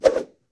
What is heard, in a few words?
Fast and consecutive double whoosh sound